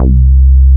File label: BASS C3 P; MR.J BASS